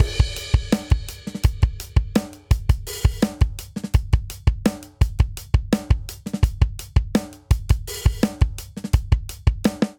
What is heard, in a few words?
Straight Seven Rock Verse Variant 7_8 84 bpm; Ableton; Factory Packs; Drum Booth